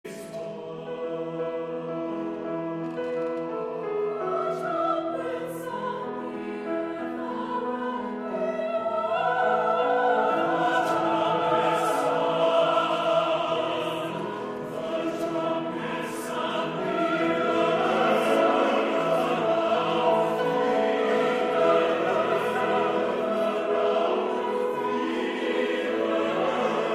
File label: trumpet sound